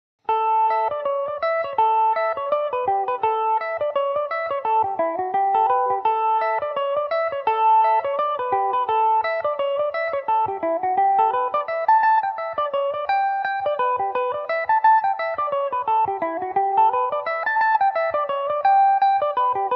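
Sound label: A mixolydian, Irish mandolin; ivy leaf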